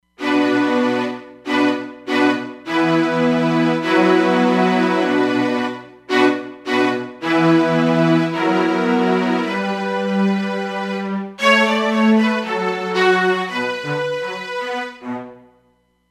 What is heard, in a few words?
Studio Sect, Roland Fantom XR